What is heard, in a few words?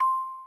receive message; friendica; jappix mini